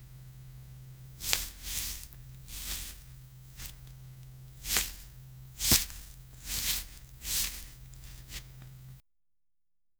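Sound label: swallow the pill; eating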